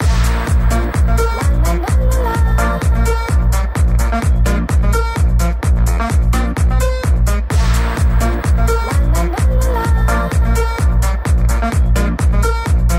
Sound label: rave stereo